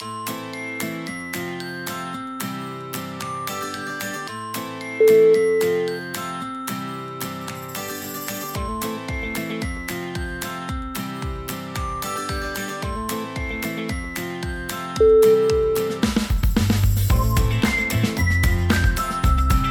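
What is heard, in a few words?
funky indie, music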